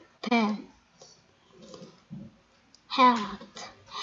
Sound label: hit